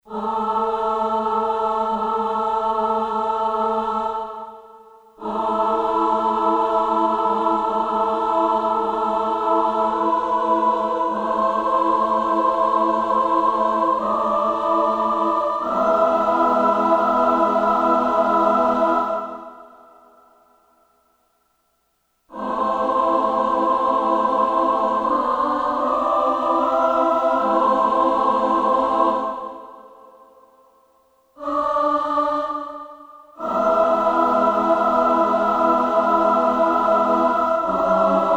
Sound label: Choir; Voices II